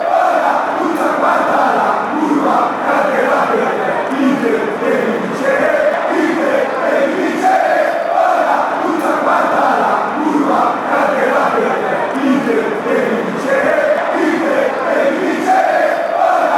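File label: you have to win, football chant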